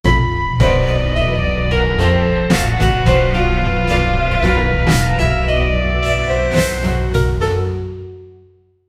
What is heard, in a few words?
halloween theme for spook